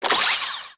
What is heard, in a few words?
gunstand servo; gow; turret judgement